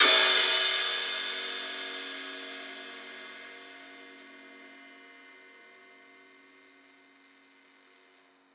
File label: crash; eddyflux; dough-samples